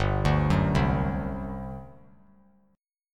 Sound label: G Major 7th Suspended 4th, G, down; GM7sus4 arpeggiated